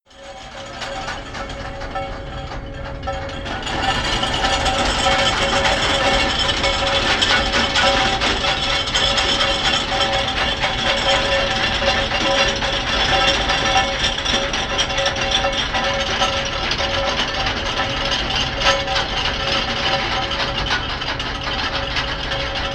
Ship in Agia Pelagia; Πλοίο στην Αγία Πελαγία

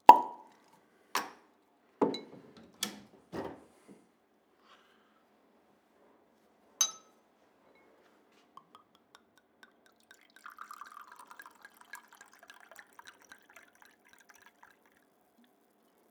Wine Production in Kythera; Παραγωγή κρασιού στα Κύθηρα

